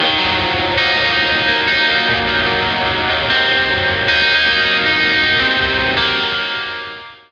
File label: Lake Hi Gtr E flat 9